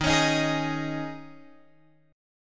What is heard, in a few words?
D sharp 7th Suspended 4th, Guitar, Open F